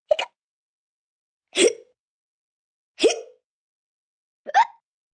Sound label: Hiccup Multiple, Hiccup, 1 child, short, high-pitched; Hiccupping Sound Effects